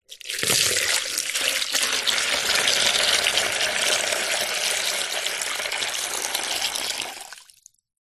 foley liquid water pour into empty plastic container